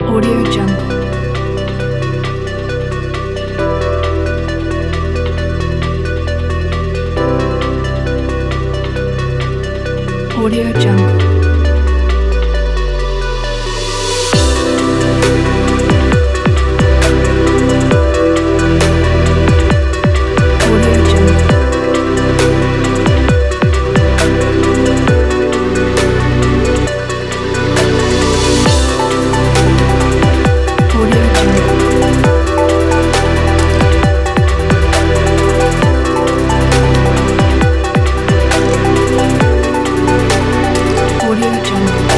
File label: future timelapse downtempo; good-sounds